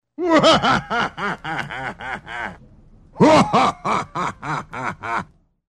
man laughing; wake-up tones

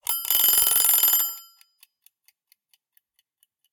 kitchen timer going off

